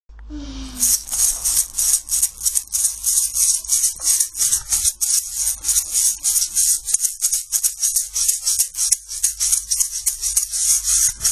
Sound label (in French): maracas